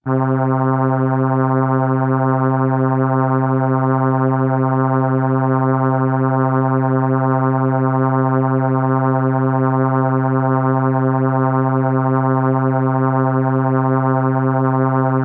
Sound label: Horn C4, ARP Solina